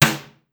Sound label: Snare, Drum Machines DDrum-AT Pitched Down; 300 Drum Machines; Clavia